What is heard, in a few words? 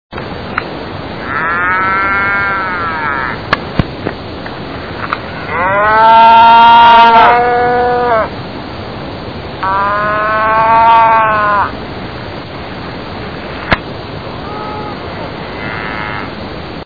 moo